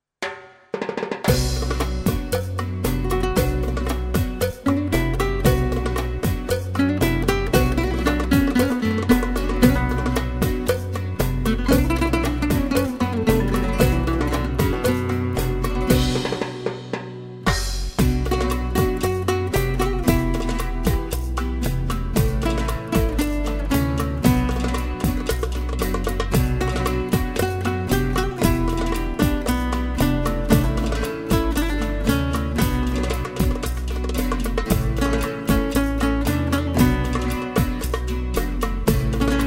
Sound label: rumba